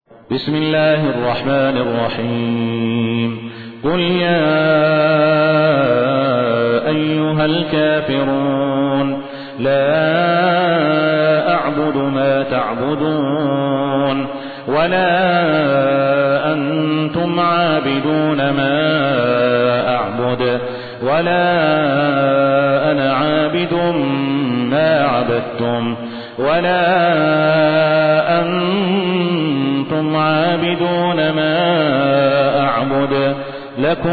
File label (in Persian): ترتیل; صالح الصاهود; سوره کافرون